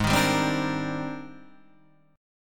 G sharp Major Sharp 11th, Guitar DADGAD; strummed; 6,6,5,5,5,8; G#M#11

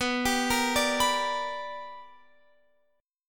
B Minor Major 7th Sharp 5th Guitar Open F; BmM7#5 arpeggiated

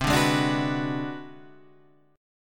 Ab 7th Sharp 9th Flat 5th, chord

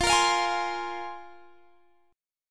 A sharp 7th Suspended 2nd F, guitar

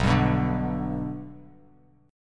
B Minor Sharp 5th B Bass Standard, chord; strummed; JGuitar

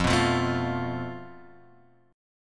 F Major Flat 5th; FMb5 Chord {1 2 3 2 X 1} strummed

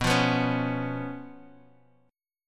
B Suspended 2nd Flat 5th B

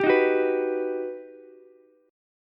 G Augmented Major 7th, Ukulele; G+M7 strummed; JGuitar

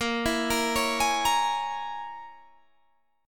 Bb Minor 7th Flat 5th, Guitar, Standard; Bbm7b5; arpeggiated; 18,19,20,18,21,18; JGuitar

